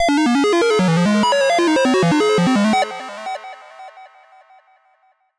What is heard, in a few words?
Synth Noise 85, hiphop 85bpm; musicradar